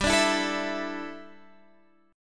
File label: A 7th Suspended 4th G, chord